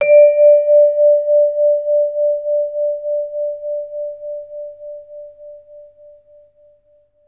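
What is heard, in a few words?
VB VISL D5, vibraphone; Best Service; Peter Siedlaczek's Advanced Orchestra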